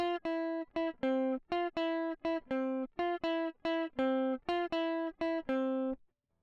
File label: electric